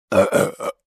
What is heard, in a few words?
Burping